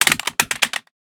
pistol drop concrete; STALKER; IWP

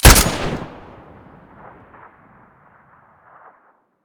svu muzzle stereo

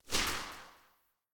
silencer p99 mono very far, weapons

